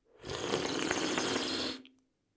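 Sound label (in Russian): звук хлебания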